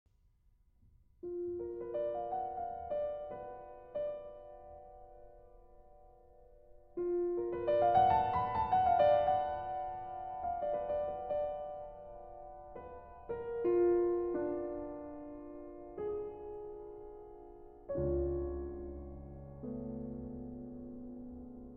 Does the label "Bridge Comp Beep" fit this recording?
No